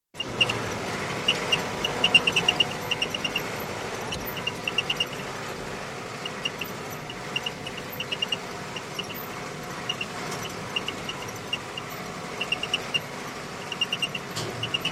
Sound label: Ford 7610 Tractor, interior, continuous run, stop, handbrake engaged, engine idles, door opened & closed, pulls away, engine switched off; Tractor Sound Effects; BBC